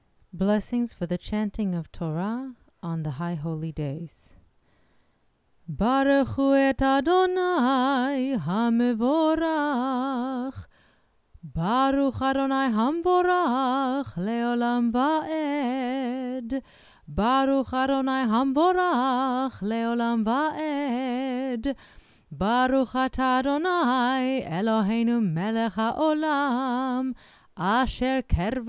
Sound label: Berachah for chanting Torah on high holy Days